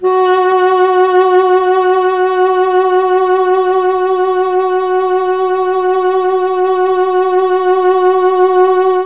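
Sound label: F#3 Tenor Sax, Mellotron; CHMB TNR SAX; Mike Pinder - Mellotron Archive CD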